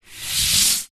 household brush scrub tile wall clean single long scrub